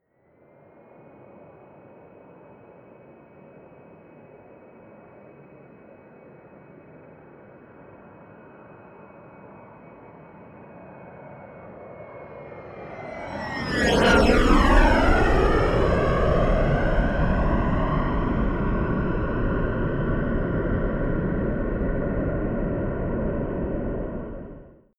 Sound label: plane bombardier private flyby left to right close